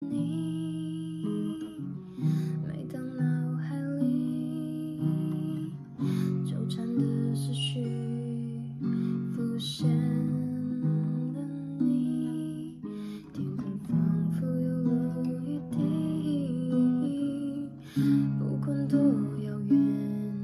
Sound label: source melody short